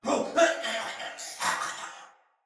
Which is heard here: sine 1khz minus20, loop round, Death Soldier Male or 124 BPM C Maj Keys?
Death Soldier Male